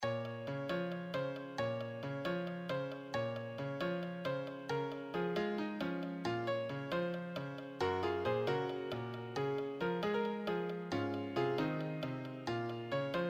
solo, piano

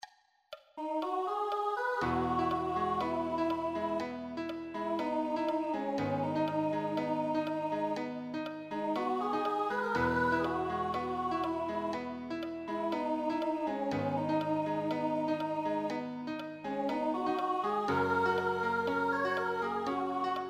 accompaniment, piano

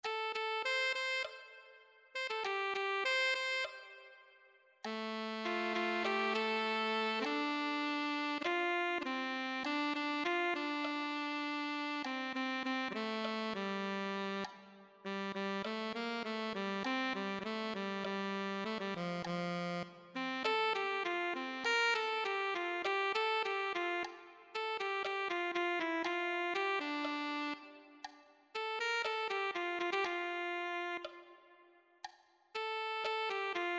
alto saxophone

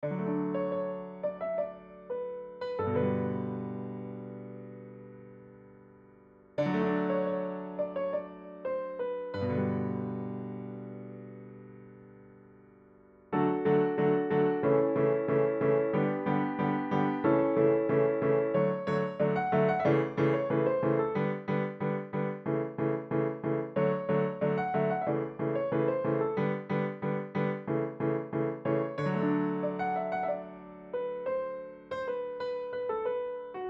solo, piano

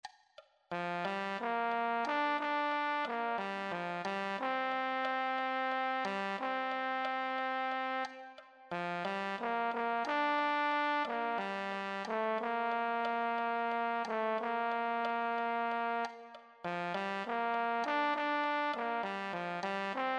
euphonium

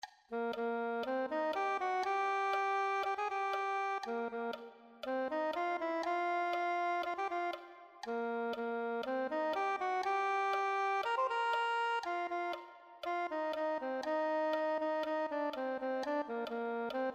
soprano saxophone

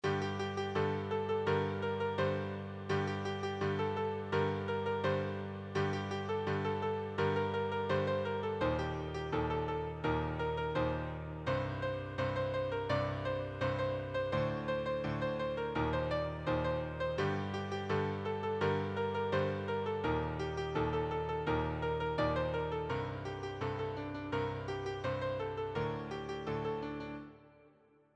solo, piano solo

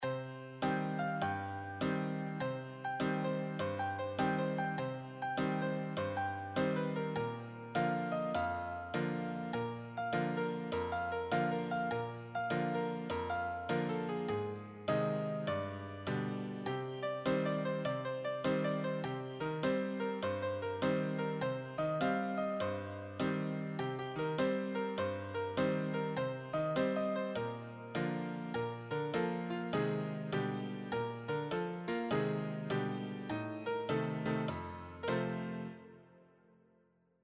solo, piano

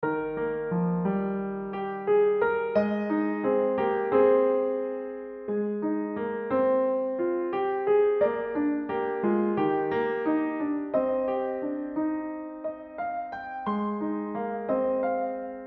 Piano Solo; Yeh Jawaani Hai Deewani

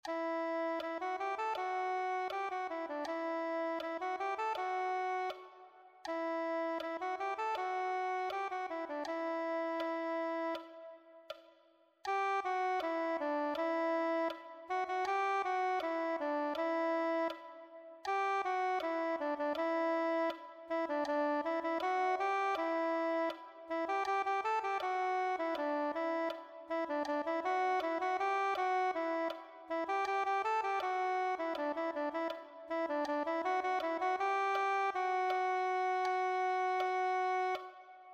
soprano saxophone

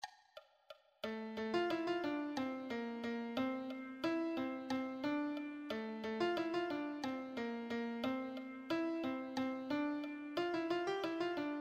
lead sheet

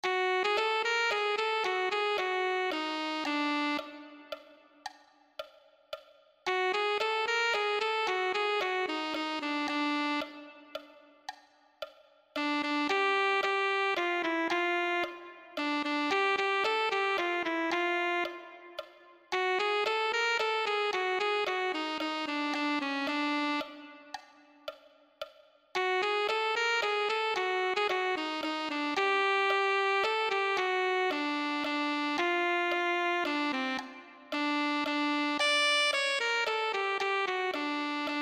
alto saxophone

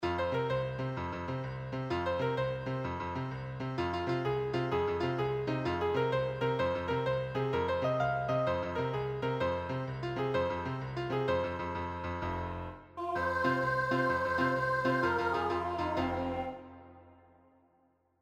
accompaniment

